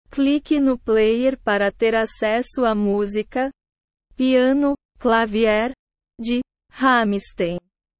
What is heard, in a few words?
piano klavier